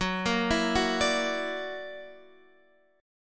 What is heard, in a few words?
Gb Augmented 7th Gb down Guitar Standard; Gb+7 arpeggiated